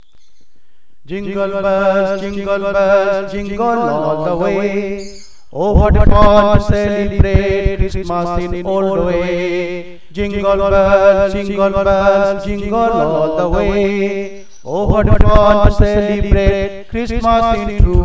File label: Jingle Bells